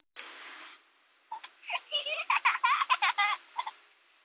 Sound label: kiddie laugh